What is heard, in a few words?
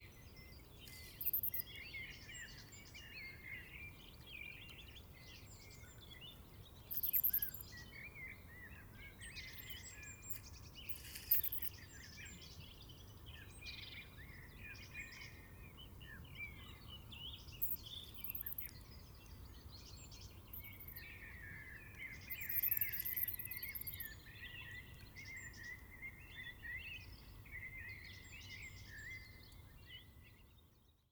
Voles Voles Squabbling Under A Thick Mat Of Grass